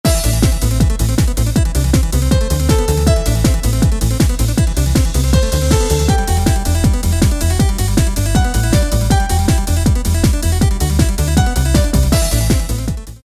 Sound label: EuroBeat